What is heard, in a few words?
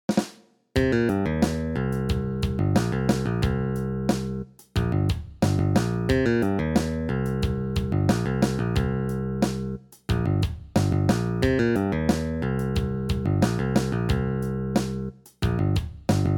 Backing Track